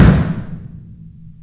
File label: K AMB, Drums & Perc; AKAI S6000 CD-ROM - Ultimate